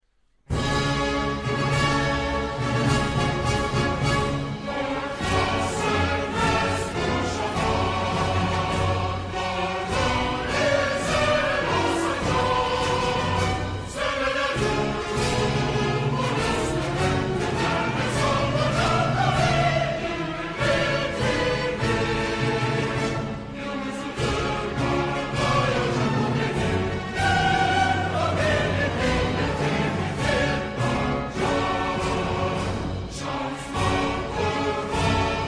Turkish anthem

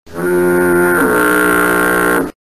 The BETTER Foghorn, A foghorn; high-pitched Dbsus4 chord with some sparkly bits

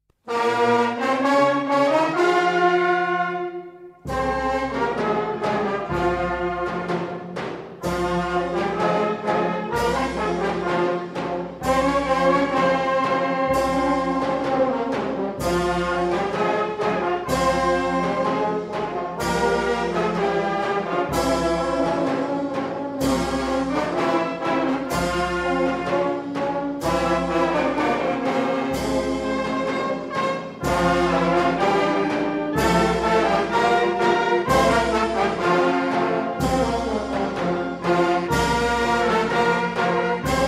Moroccan anthem

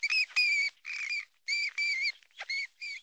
So-called "bat" screech; This sounds more like a hawk than a bat

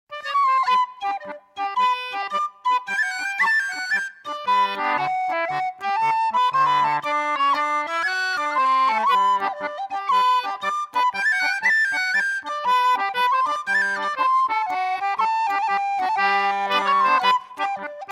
The Rake Hornpipe

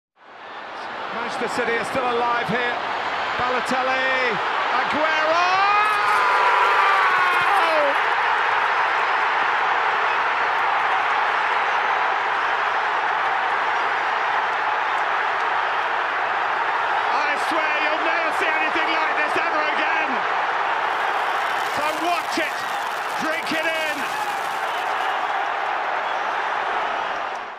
AGUEROOOOO

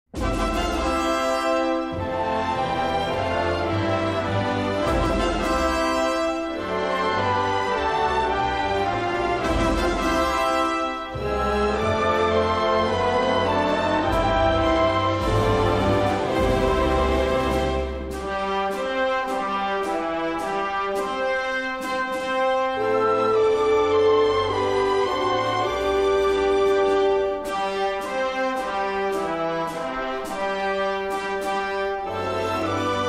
Australian anthem

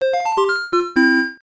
Casino win; DSi Casino

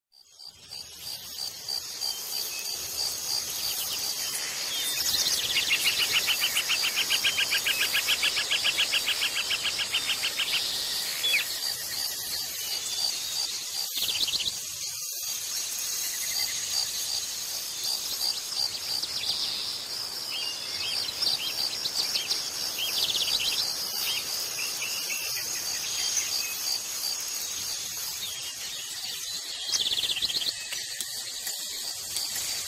Environmental Noises

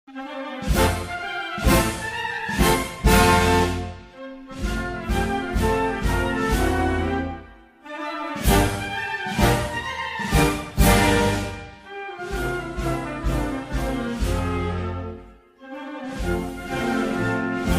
Brazilian anthem

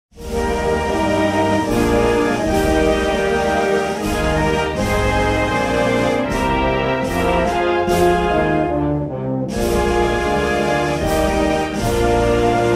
Austrian anthem

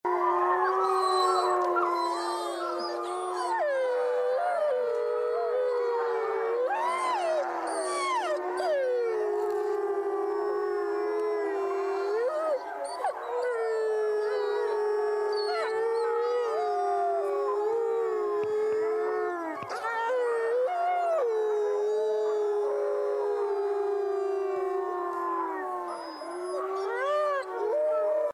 Actually wolves, A pack of wolves

